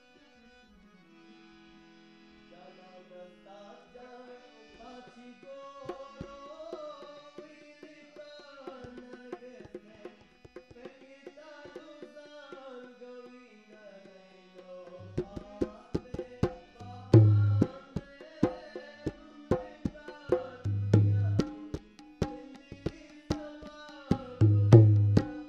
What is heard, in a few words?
drums only